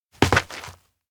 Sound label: steps outdoor gravel land; kurumi; anemoi